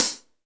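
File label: hi-hat, Real Mega Drums; Best Service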